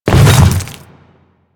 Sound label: Hammer Boss Step Ice, Footsteps; Warframe; Grineer